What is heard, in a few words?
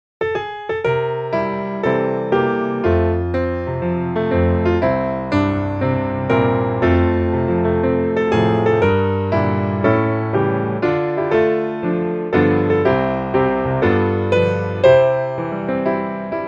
piano